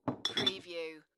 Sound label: Beer Bottle Clink; beanstalk audio